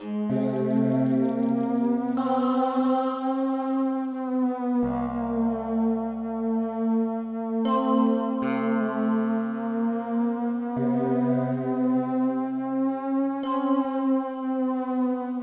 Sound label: AMBI DRIP; croc